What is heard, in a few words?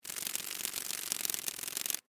Insect Wings Fly, Steady, Bug; Animal, Insect, Wings, Fly, Steady, Buzz, Bug; Wing Sound Effects